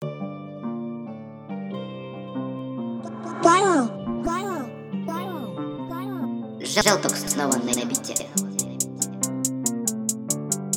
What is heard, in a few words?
Upset Guitar